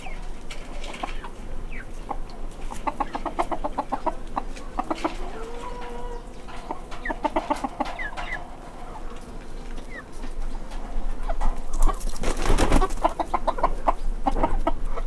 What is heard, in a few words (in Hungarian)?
kotyogás reppenés, magyar tyúk; parasztudvar